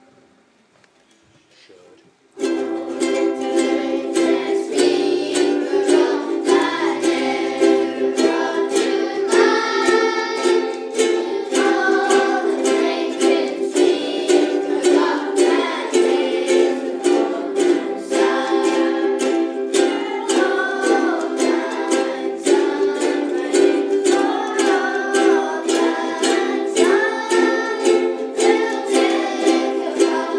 strumming auld lang syne, First attempt at strumming Auld Lang Syne. Some of us a trying an E7 chord.